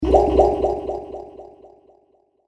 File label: Water Bubbles With Delay; Water Sound Effects